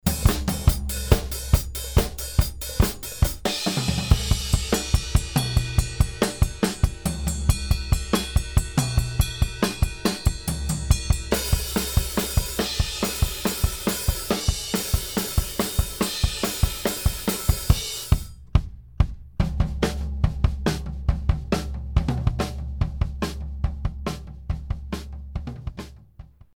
Drum Set alle Spuren einzeln komprimiert Stereo Summe

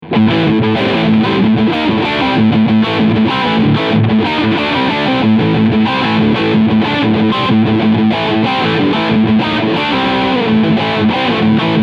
L7 TBL Riff Steg PU; Larry Carlton

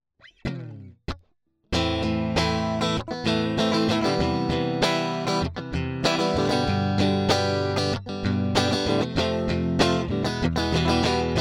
E Gitarre; UA Apollo Twin

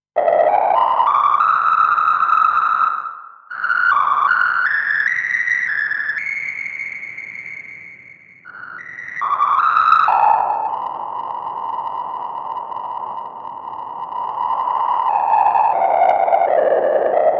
Scale Noise mit Controller

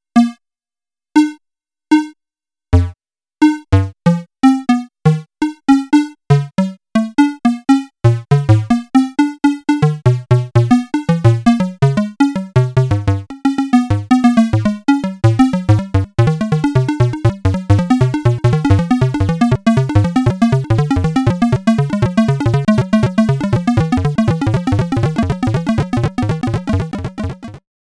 Eurorack CV Steuerung Pitch Verlauf schnell; Coherence

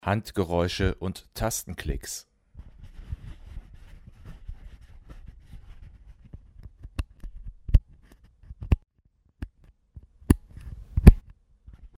zoom h1v2 handgeräusche und tastenklicks

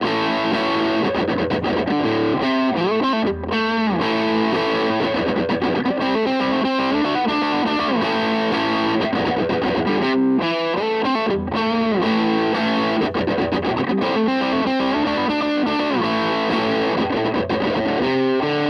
Harley Benton Vergleich HB Zerre; Maybach

